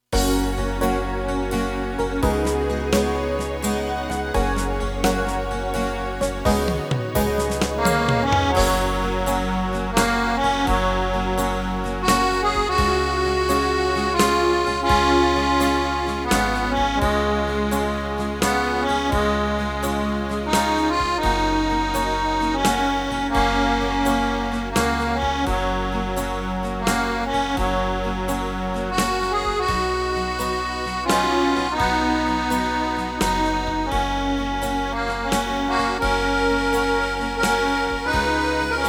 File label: Accordion; Berounka River